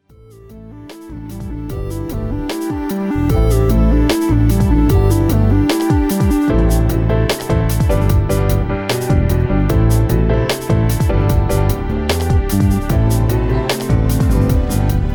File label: GUAJIRA